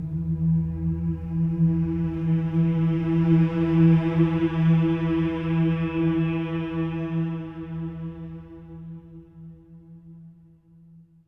MM E3, Symphony of Voices, swell; SWELL MMS

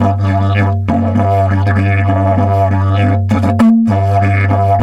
DIDGE 100BPM, Didgeridoo

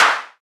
snare mod

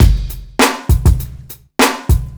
Street Tip, R&B Drums

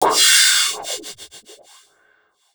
PROCYMB, CYMBALS

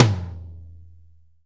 TOM, Drum Dry; East Collexion; Drum 1

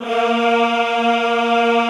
EHH A#2, Classical Choir; Best Service

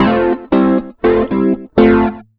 rock, acoustic guitar loops

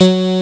ding, Amiga Soundtracker; ST-XX Amiga Soundtracker Sample Packs

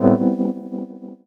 Chords Tones; Equipped Music; Loungin House

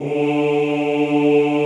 OOH D2, Classical Choir; Best Service; Extended Classical Choir

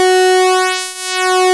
PULSE MOD

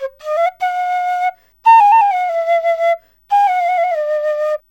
SERULING, Heart of Asia

